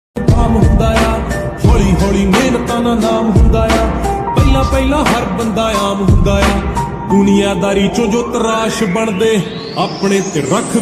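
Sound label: Punjabi ringtone attitude ringtone remix background music ringtone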